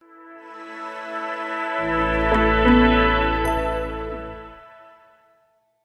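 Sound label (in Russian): звук Windows Vista Media Center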